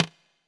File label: Toms, Elektron MachineDrum; SPS1 MKII